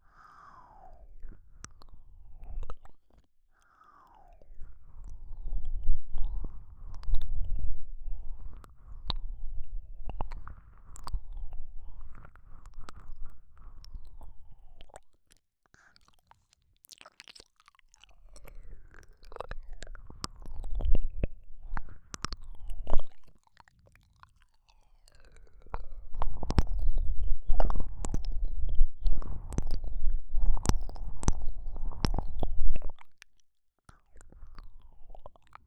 耳舐め アーカイブ

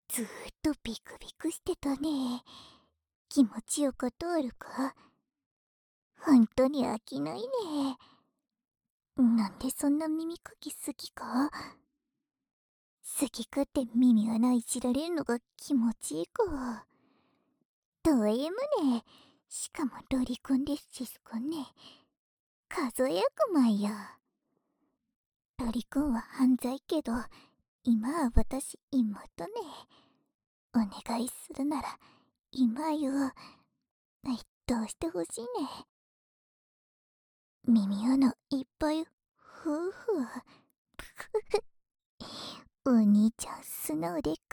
左 耳ふー

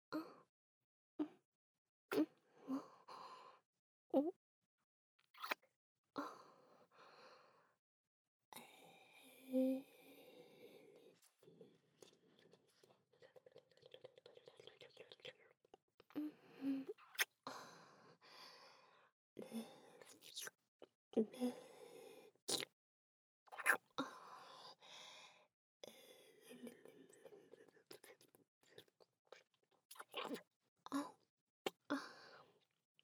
乳首舐め, ASMR

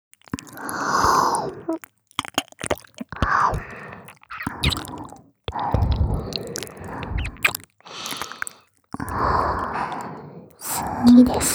2人の同時耳舐め

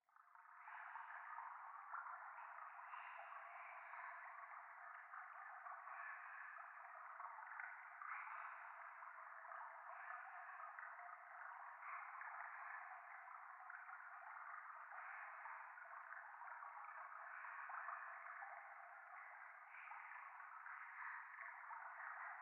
廃墟 左耳密着処女喘ぎ 川のせせらぎ

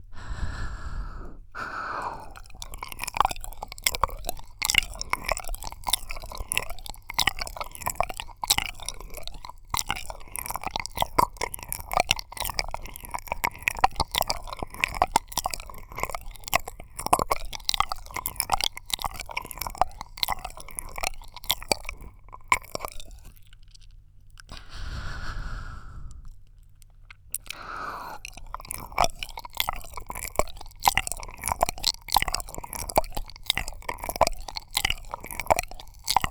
奥行舐めコース

